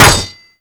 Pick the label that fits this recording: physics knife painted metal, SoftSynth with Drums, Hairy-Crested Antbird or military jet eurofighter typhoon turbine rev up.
physics knife painted metal